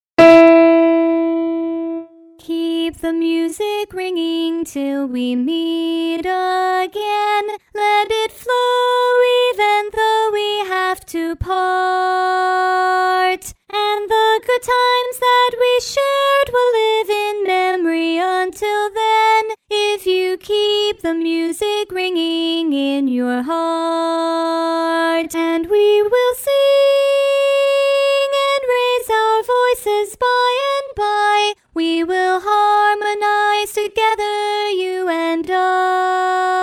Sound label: Lead Alone